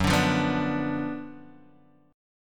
Gb Major 7th Suspended 4th Sharp 5th Gb, Guitar Half Step Down